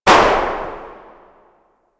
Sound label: Magnum Tail 2D, Weapons; Warframe; Tenno